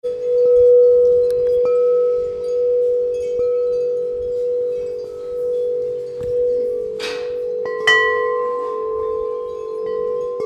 large chime; cave

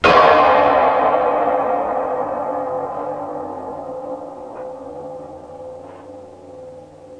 can, drums

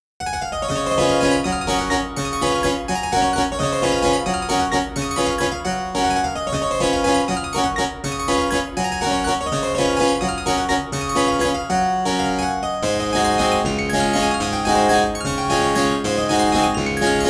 chopin in baroque waltz op70 no1

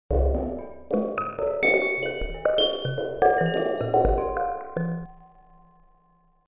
prelude; Csound demos

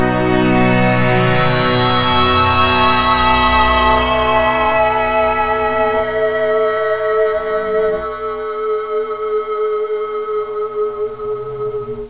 b maj, guitar chords

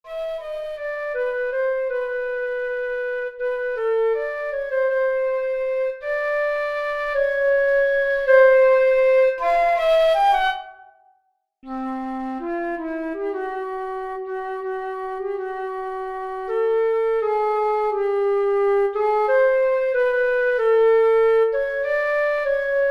flute solo golden spectrum, flute compare tunings

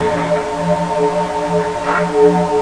noise

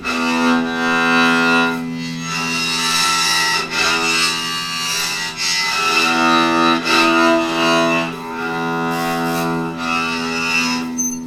bowed cymbals; metallic

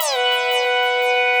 lead, korg

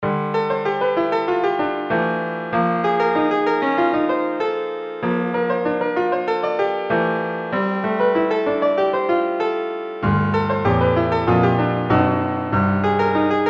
piano

